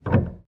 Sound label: piano release